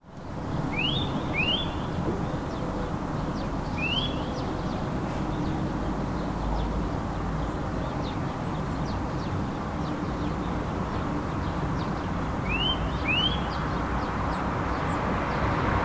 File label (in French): sittelle